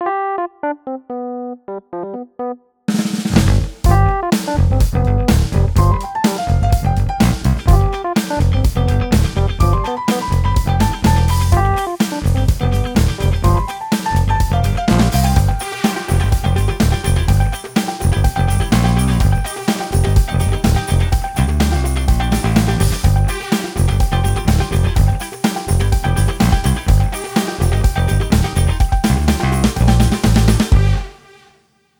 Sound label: Funky Ad Music